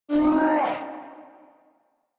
Attack Roar, Boss; Sargas Ruk

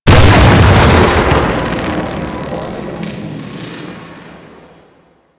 Death Explosion; Warframe; Captain Vor

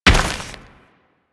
Combined Step Dirt, Footsteps; Enemies; Warframe

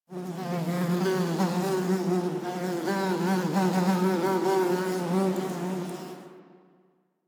Insect Flyby; Ambience; One Offs; Insects; Warframe; Quests; The New War; Drifter Camp

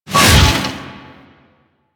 Melee Whoosh; Warframe; DE; Sentients; Erra; Weapons

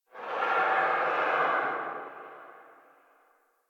Tree Distant Critters Angry, Ambience; Warframe; Duviri

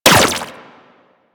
Laser Fire; Moa Laser

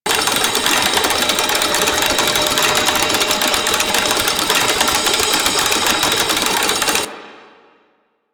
Combat Shrine Idle Loop; Warframe; Duviri

